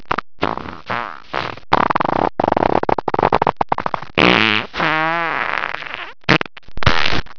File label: FARTS